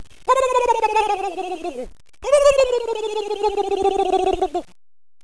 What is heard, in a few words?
gobble